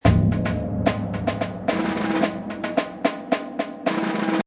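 Orchestral Snare Kit 110, Orchestral snare loop 110 bpm; Orchestral Drums Loop; Drum Loops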